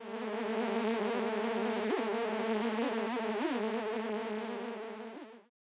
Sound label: Mosquitoes buzzing